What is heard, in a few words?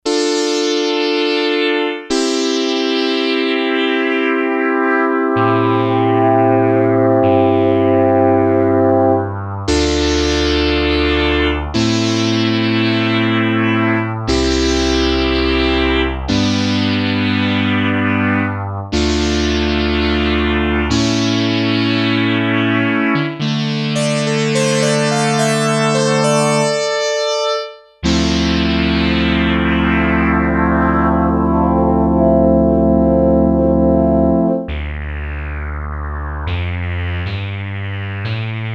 Sequential Circuits Six-Trak; 35 Obiechords; Factory Programs